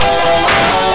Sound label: YM FX Guitar; SFX; ChipShop Deluxe; ChipShop Hits